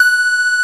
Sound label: CS-15; Masterbits; Vintage Voltage; LEADS